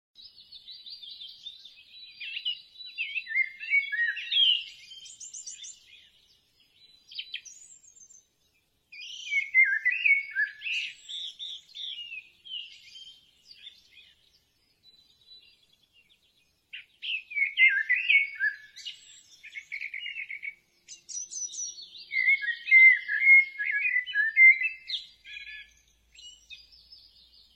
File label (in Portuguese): Canto do estorninho de bali